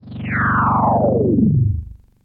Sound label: Moog Bass Sound